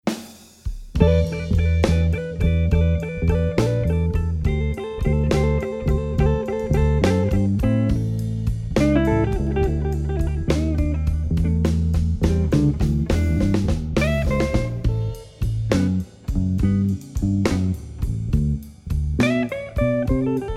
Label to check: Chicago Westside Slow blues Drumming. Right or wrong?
Right